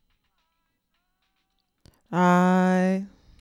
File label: lead vocal